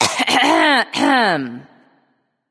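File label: clear throat, female; smite; voicepack; hera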